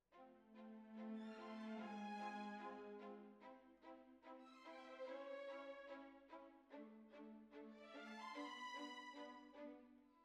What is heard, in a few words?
Flute